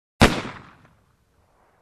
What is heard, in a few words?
Pistol Shot